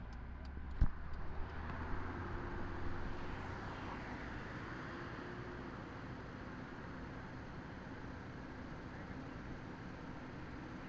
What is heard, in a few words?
Car Driving